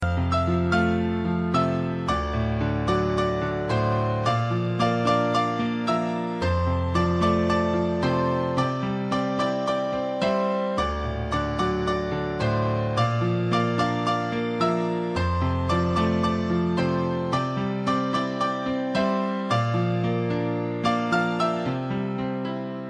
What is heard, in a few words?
Soft Music